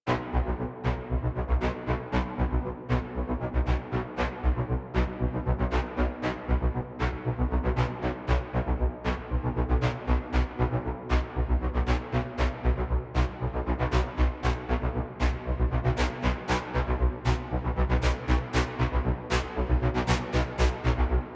Hvy Blades Synth; Warframe; Dynamic Music; Grineer; Grineer One

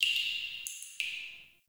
Cave Drip; Ambience; Caves; Glades Of Andurin

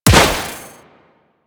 Pistol Impact Generic; Sentient; Crp Sent Amalg Pistol

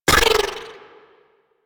Sniper Rifle Layer; Wraith; Grineer

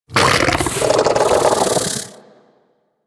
bug vocal mount

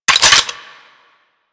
Clip Out, Weapons; Dual Vipers

